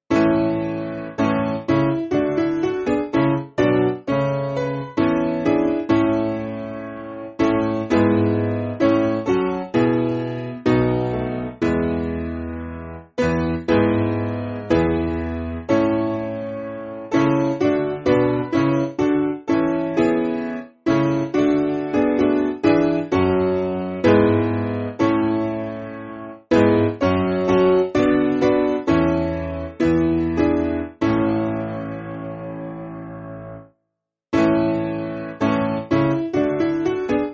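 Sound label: Piano, Free Music Backing Files for Churches; Broomsgrove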